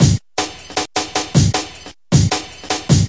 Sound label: 155 hard stop, drum loops and breaks; 141 to 160 bpm